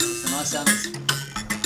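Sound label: PLK Bass Mbira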